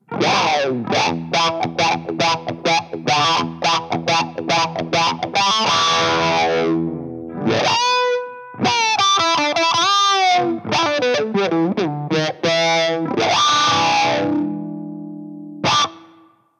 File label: Drive Attack